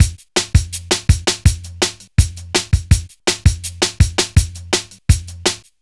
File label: 165, Drum Loops, Drum & Bass; Total Drum&Bass